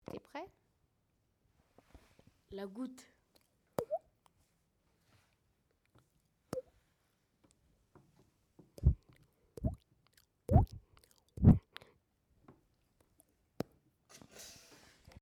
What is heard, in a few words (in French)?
la goutte